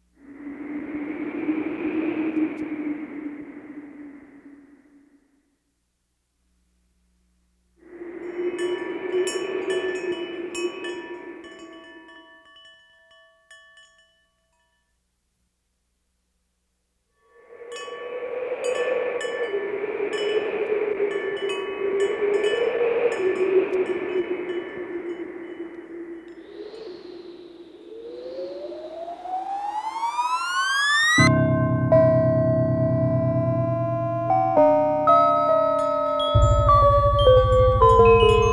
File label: Rainstorm